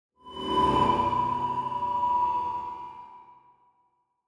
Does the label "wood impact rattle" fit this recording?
No